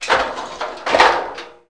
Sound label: gate open; Hellboy